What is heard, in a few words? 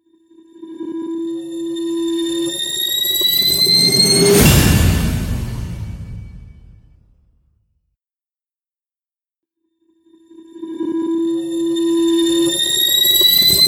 whooshes adrenaline punch